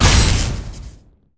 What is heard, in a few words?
Stun Fire